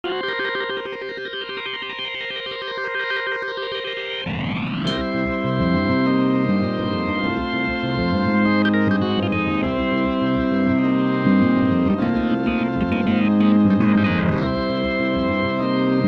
farfisa

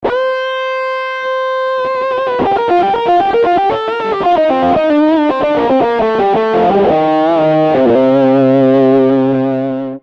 Dark Solo

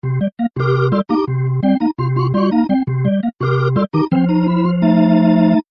Leslie B3

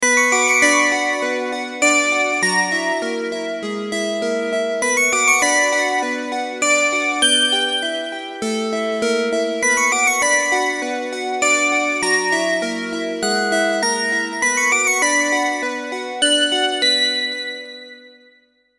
soft bells

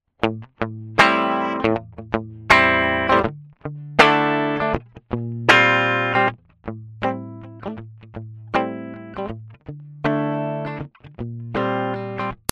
AC30 mellow crunch

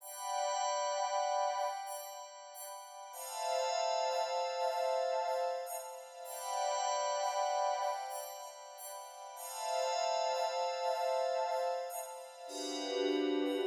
Passionate Saw

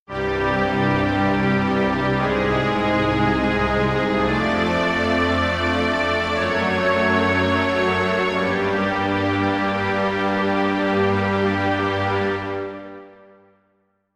Full Stage Orch

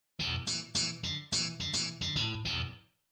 Spank Funk Gtr

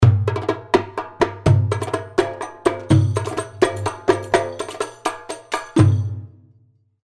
Wals 125, darbuka

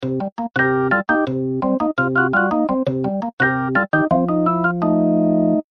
Perc Organ

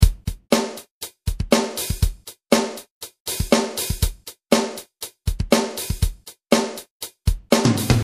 Fur Kit

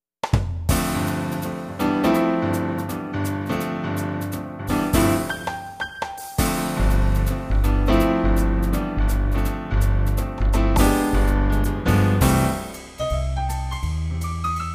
Piano

